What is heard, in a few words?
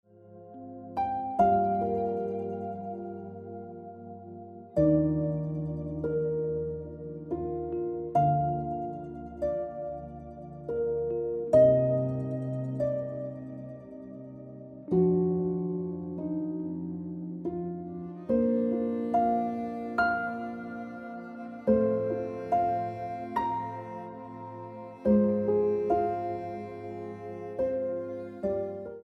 Jade Ringtone Piano Music